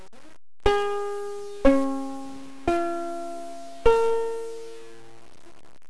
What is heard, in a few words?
gcea